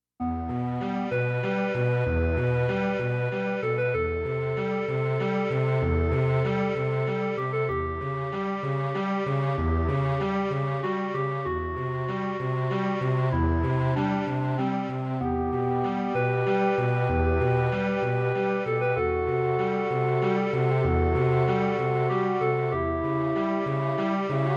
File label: SC-55 MT-32 Soundset; Deathgate